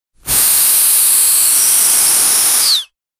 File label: slicing through air drama, weaponry